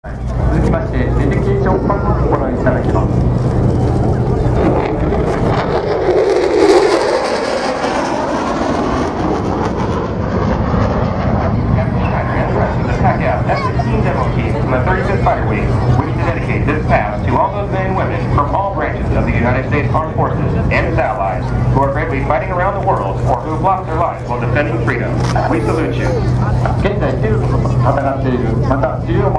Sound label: F16 sound